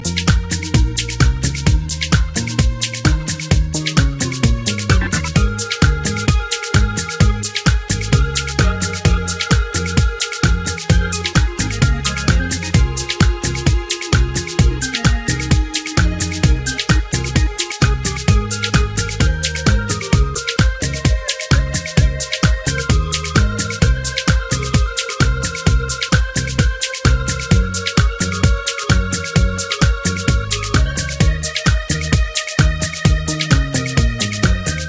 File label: Inst